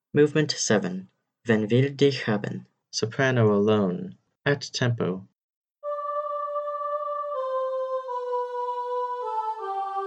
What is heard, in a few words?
soprano alone at tempo, choir